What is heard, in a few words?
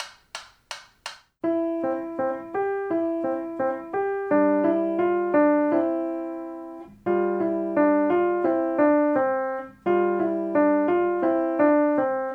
Secondo PLAYALONG; Sommerlied; klavierschule tastenmini band 2 pausenbuch